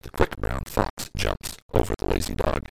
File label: octaver